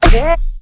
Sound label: Snowman Tap; Clickergame